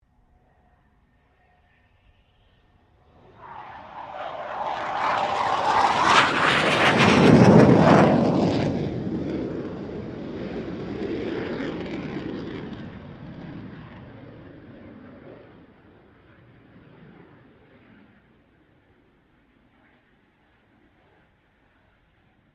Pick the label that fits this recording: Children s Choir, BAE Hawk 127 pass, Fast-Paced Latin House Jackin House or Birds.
BAE Hawk 127 pass